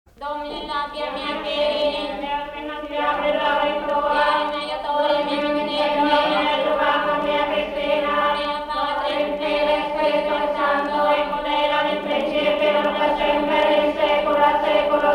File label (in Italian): polifonia a cuncordu, canto a tenore; archivio rai sardegna; musica strumentale